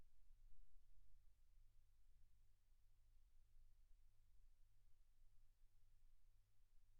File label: silence 48kHz